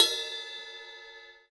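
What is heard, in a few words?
Bell Med, cymbal; hydrogen drum samples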